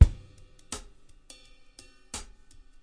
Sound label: kick and hat, 085 bpm, drum loop